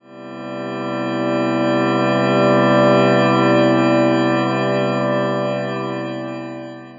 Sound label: ethereal glass pad one shot key of c; 7 seconds